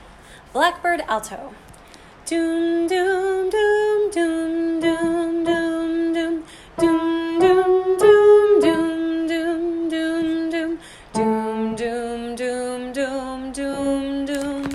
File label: alto